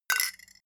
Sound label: spoon drop full cup